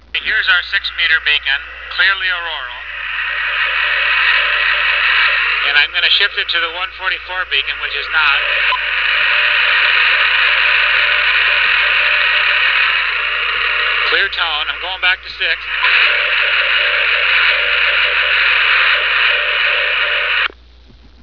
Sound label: 50 vs 144 mhz, VHF Radio Aurora Audio